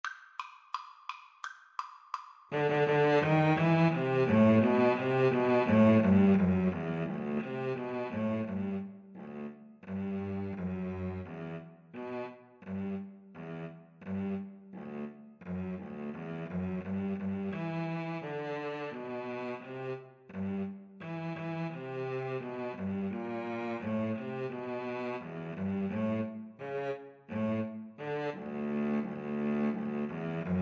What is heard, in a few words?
Cello Duet